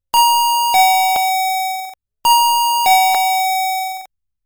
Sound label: Dingdong